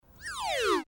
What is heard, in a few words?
Wrong Answer; Bullseye